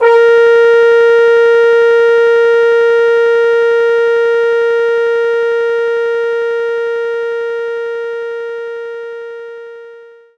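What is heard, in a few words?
French horn Bb4; knights try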